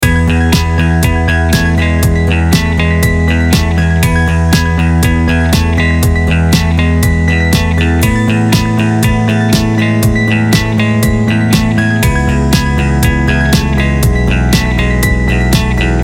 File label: Corporate Infomatic Loop